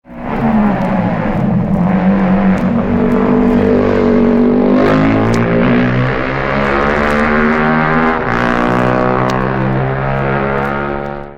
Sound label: MV 250 Twin